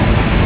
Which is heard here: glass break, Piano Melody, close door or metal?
close door